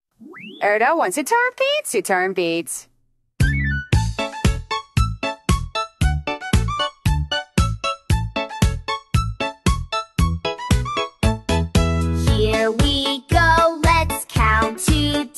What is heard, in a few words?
Beat